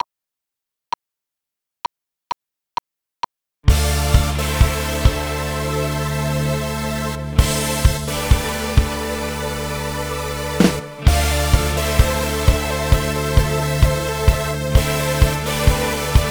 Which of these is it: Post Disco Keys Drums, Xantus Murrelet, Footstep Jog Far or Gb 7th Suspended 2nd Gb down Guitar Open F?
Post Disco Keys Drums